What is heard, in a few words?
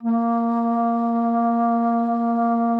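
bass flute A#3; Xsample VOL-2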